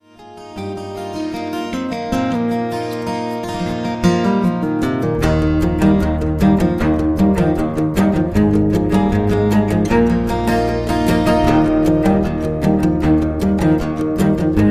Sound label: Instrumental Version